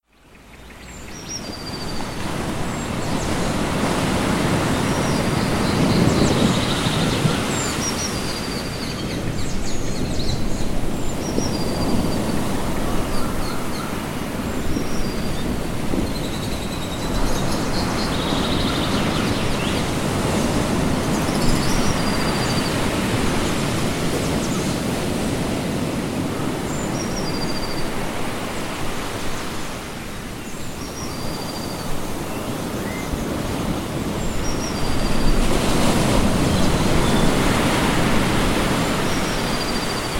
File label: ocean jungle